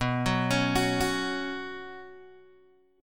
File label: B Suspended 2nd, Guitar, Open G